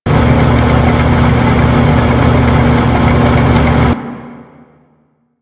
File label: Gas Generator Fan Loop; Ambience; Warframe; Corpus Gas City